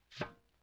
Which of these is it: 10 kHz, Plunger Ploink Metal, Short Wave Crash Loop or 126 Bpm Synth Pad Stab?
Plunger Ploink Metal